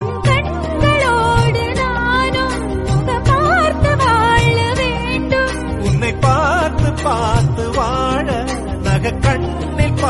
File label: Tamil Ringtone; Thavasi